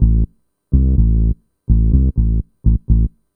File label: DEEPHOUSE BASS 125 A